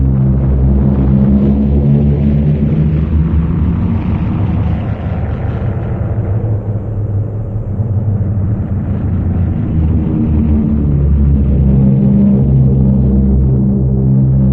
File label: citadel alert grind loop; ambient; city; source engine; episodic